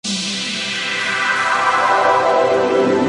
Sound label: melody